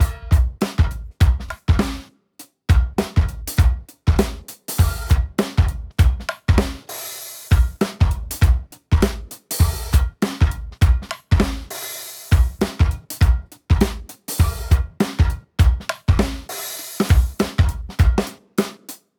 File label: Loop HiFi 100 Bpm